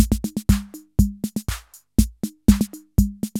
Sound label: CR-8000 LOOP, DRUM LOOPS